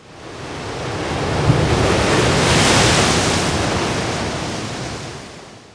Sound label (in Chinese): 海浪; 红色警戒2; 环境音效